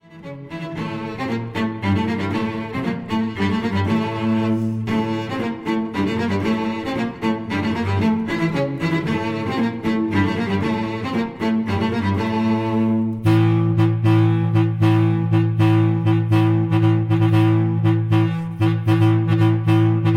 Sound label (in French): honga de podolie instrumental